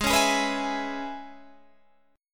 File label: C sharp Minor 7th; Gsharp